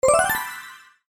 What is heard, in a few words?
spin